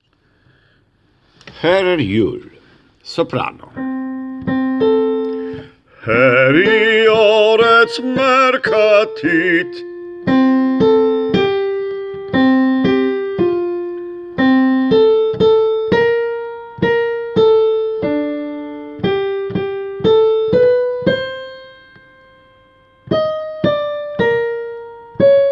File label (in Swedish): sopran